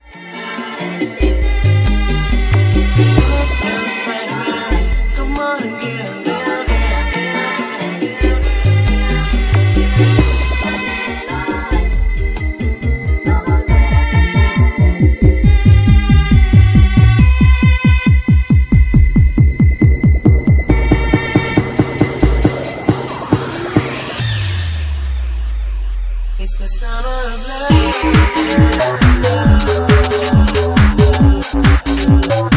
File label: Bassline Remix